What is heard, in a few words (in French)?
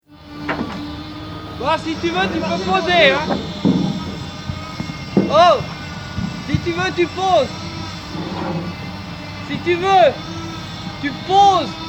repetition chantier